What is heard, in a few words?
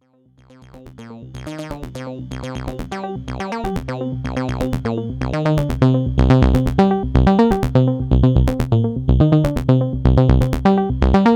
Axxe Mix